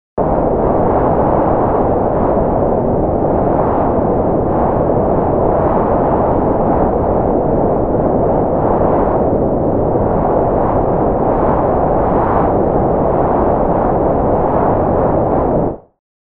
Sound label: heavy wind